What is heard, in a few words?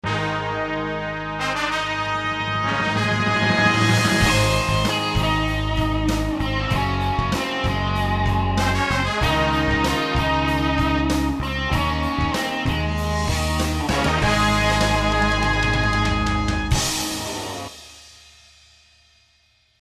Happy